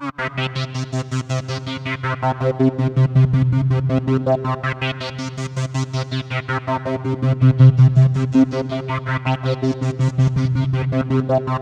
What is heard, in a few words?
Roland JV 2080 LFO C3; MISSION LFO